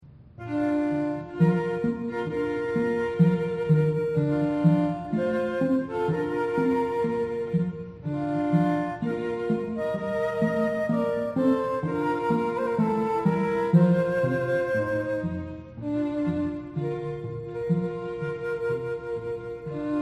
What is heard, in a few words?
bridal chorus, trio; trio virtuosi; wagner